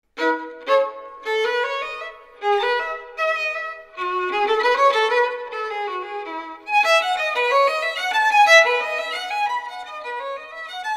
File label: Bourree